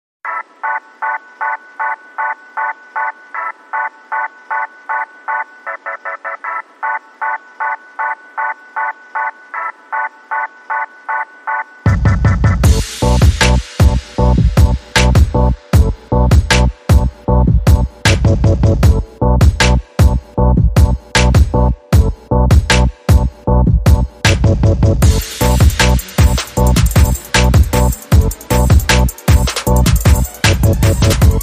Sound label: Door Knock